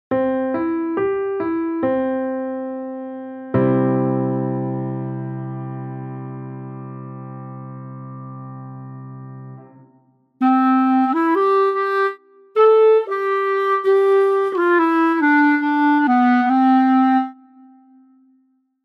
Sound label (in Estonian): Klarnet; c-duur